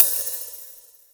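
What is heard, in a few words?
hi-hat, drums; Best Service; Real Mega Drums VOL-1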